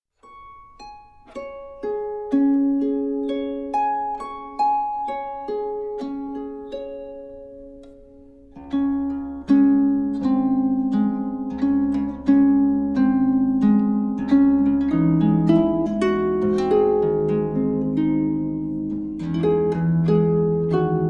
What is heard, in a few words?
cradle song, harp